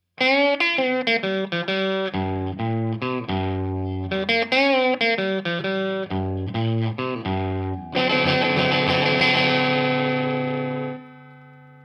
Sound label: tele chevalet manche